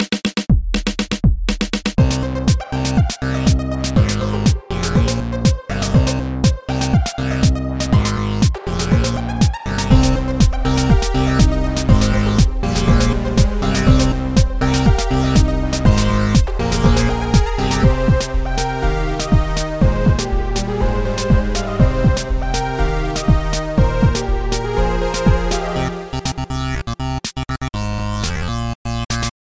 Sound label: dance groove